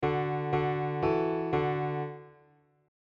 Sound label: neighbor tone